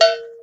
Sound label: cloocow, Cowbell Blocks